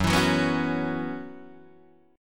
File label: F sharp 7th Suspended 4th, Guitar, DADGAD